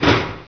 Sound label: LNCH MINE